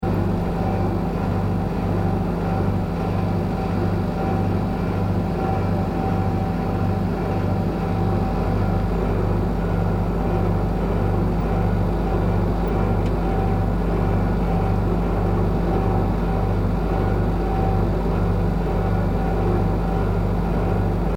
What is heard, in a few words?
Vending Machine Operating Loop, Japanese vending machine; Machinery; Sound Effects:Machines